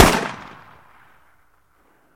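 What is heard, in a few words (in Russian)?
шумный одиночный выстрел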